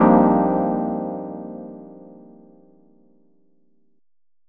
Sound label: stab satisfying; Melodic Stabs and Hits